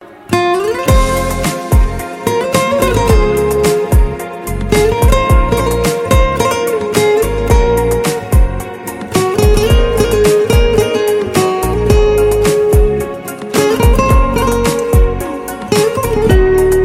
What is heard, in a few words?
Ringtone